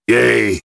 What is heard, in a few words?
yay